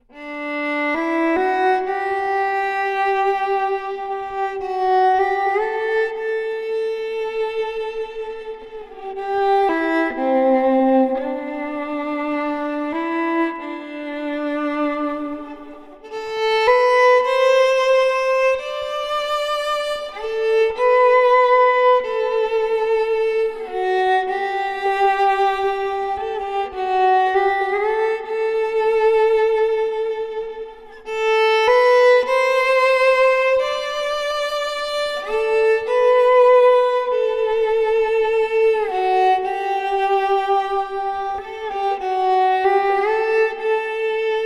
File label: Solo Viola; She Moved Through the Fair